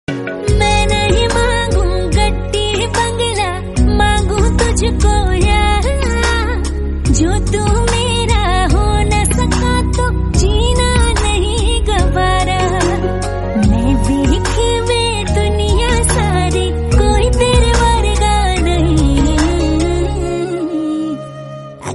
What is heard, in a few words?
Hindi Romantic